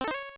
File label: hit; trimui model s; gmenunx; miyoo; evilaustralians